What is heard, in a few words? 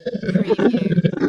Monster Mammal Bleat